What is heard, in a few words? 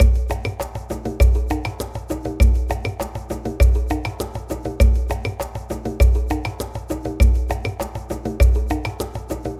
Cave Ceremony, percussion